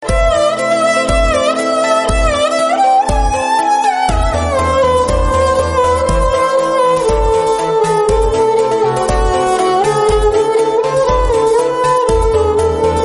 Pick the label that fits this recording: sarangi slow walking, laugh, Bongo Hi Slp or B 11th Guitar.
sarangi slow walking